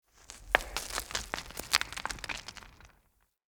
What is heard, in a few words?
Cliff Rock Debris Small; Ambience; Midrath Island